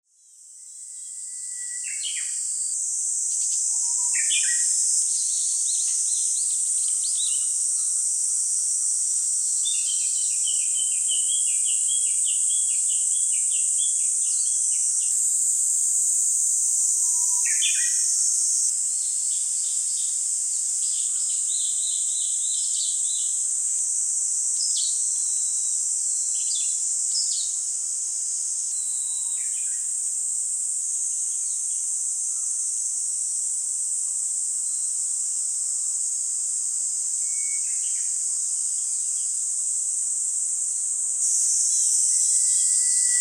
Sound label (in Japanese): 東京都多摩中央公園でのうぐいすです。; 2025年7月中旬に、東京都多摩中央公園でのうぐいすです。; 野鳥の声